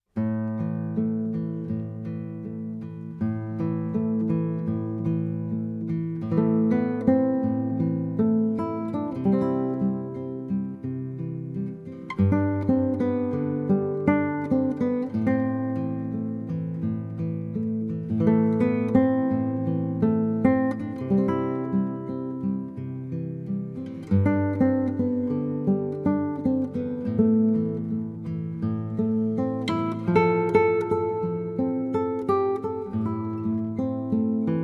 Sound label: song Guitar